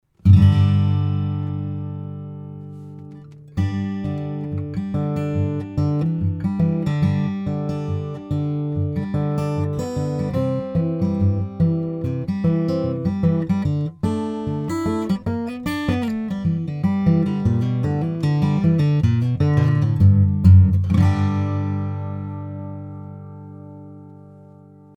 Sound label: Collings D2 Traditional